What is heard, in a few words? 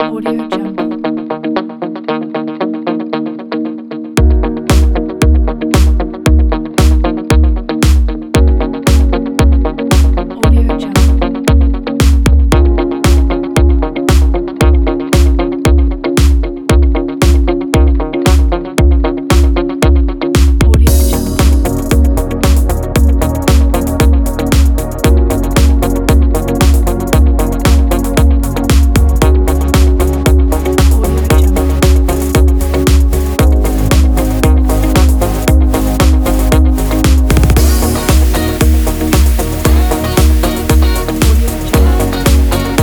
smooth motivational pack